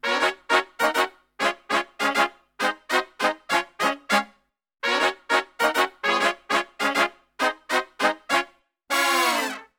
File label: BRASIL, Brass Super Section, BRASIL LICK; Best Service